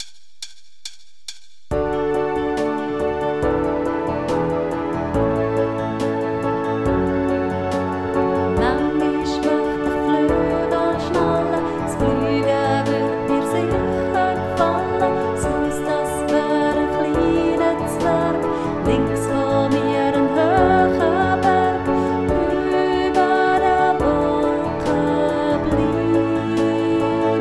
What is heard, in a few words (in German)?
einstimmig